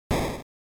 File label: General Noise Single